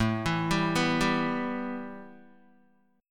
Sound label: A 7th Suspended 2nd Guitar; A7sus2 arpeggiated